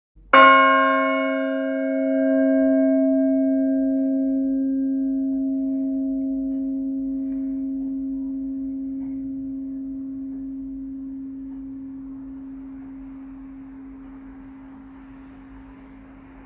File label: bells of sussex; balcombe